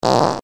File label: bad roll; Dice World